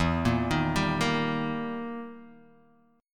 E Minor Major 7th Flat 5th, E, down, Guitar, DADGAD